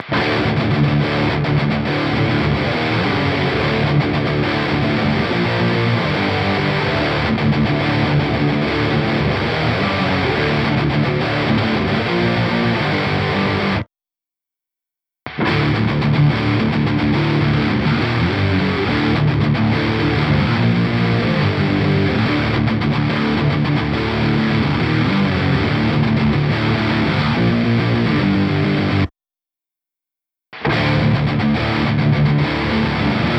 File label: Metal